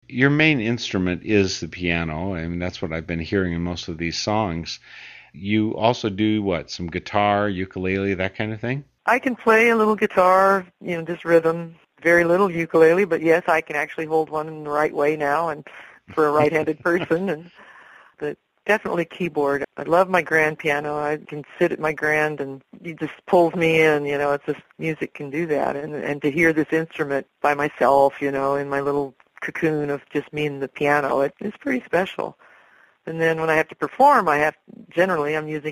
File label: PIANO